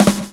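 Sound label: drum roll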